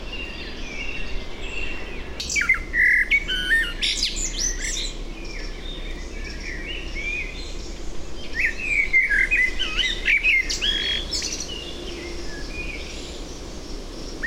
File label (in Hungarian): fekete rigo hangja